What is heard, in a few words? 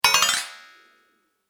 Metal Magic Up; Magic Sound Effects